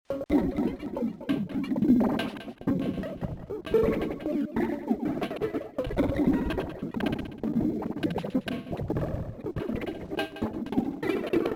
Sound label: 106 to 113 to 106 to 82 bpm; ooch choo; weeklybeats 2026